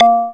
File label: A#, Synclav FM PERCUSSION; Big Fish Audio